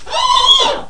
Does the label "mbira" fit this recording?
No